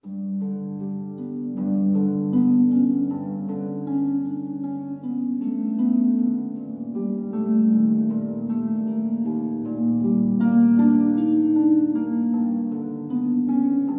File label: Harp Stem; Mobile Home - Ambient 4; ps4 ps5 switch windows xbox one xbox series xs gamerip 2024